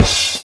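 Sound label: drums, Pure Electro; USB Soundscan vol.03; AKAI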